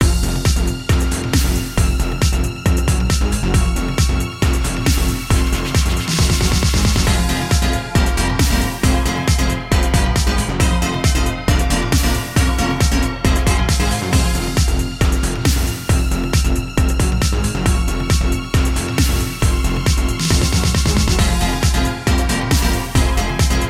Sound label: desert battle middle boss